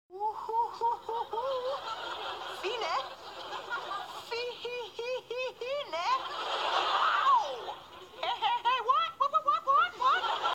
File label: woo hoo hoo